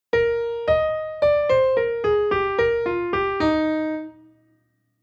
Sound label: transposition and modulation